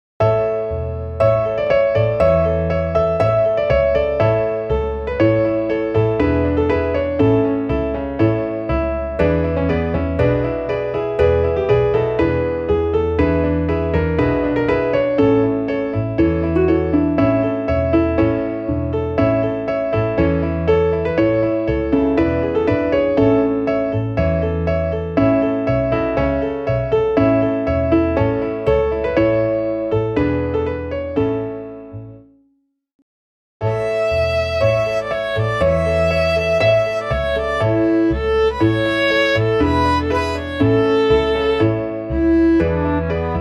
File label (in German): klavier; Zum Tor hinaus; Komm, zieh mit uns hinaus